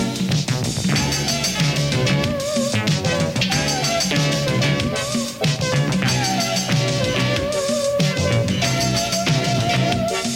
Moog Solo

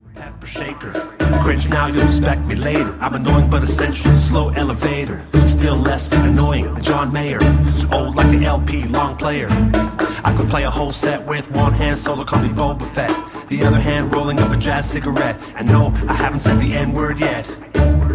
bongo monologue

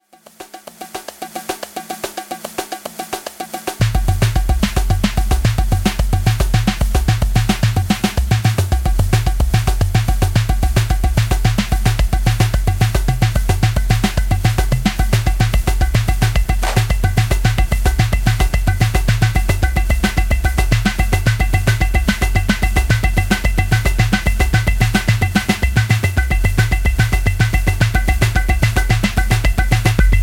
Thunder Drums

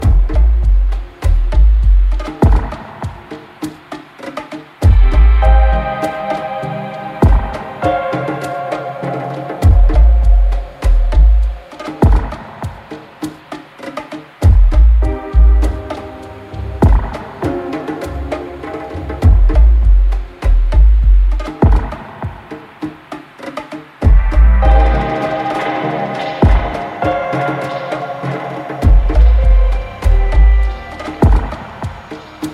Djembe

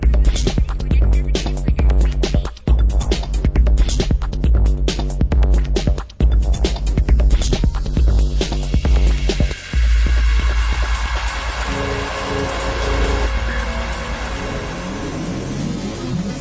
static lock

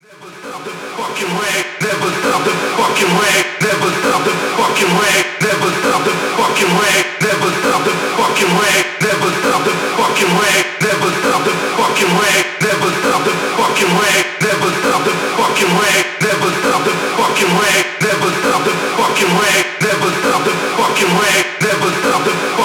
LOCK GROOVE

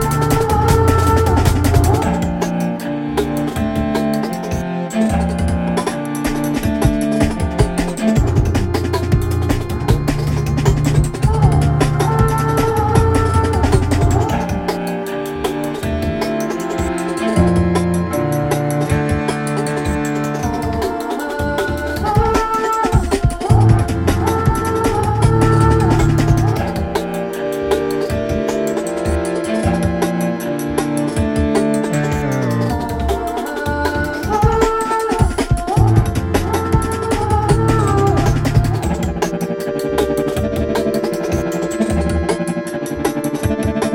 Cello Renoise

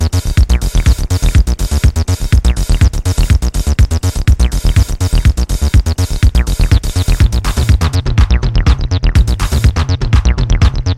Slap Box

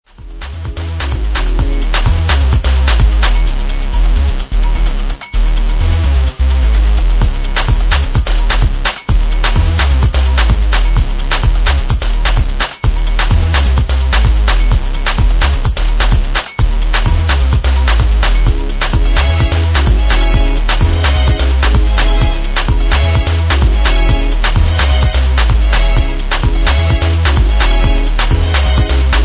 glitch dub

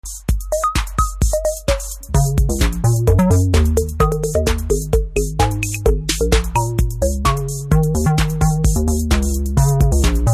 Old Melody

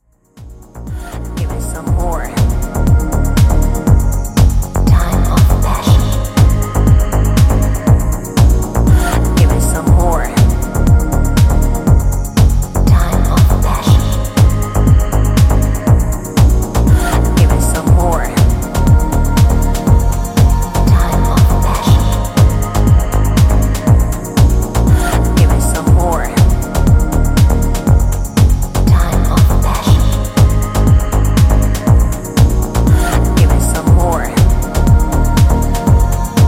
Ambient Dance

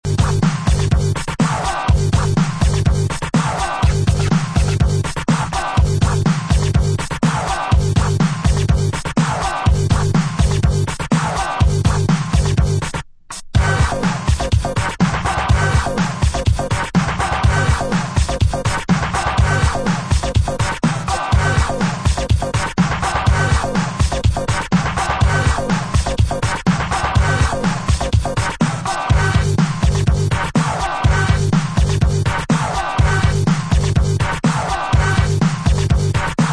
kiss & horns; Institubes

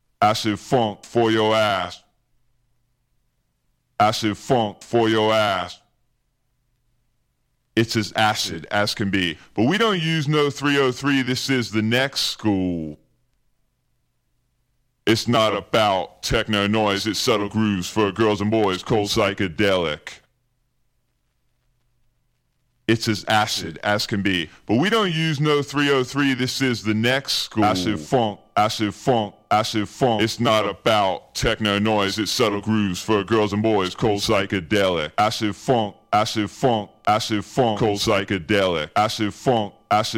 Acid Funkapella

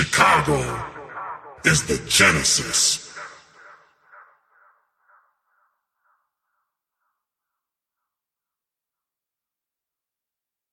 acapella

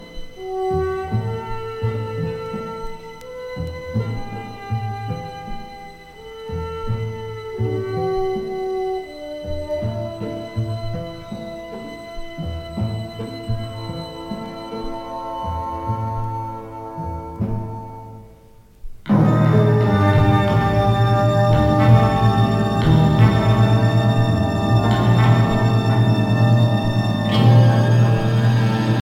Dry Horn

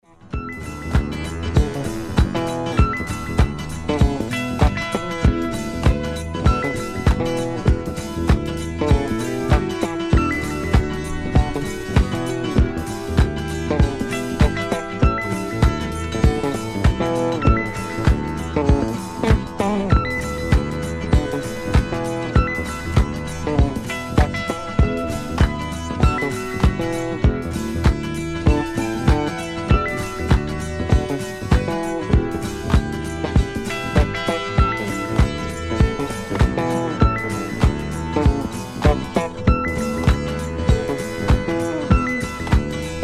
funky bass

